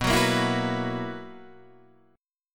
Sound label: B Major 7th Suspended 4th, guitar chord; BM7sus4; strummed; 7,9,8,9,7,7